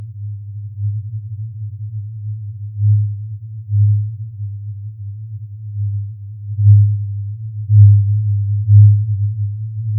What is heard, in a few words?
ufo alien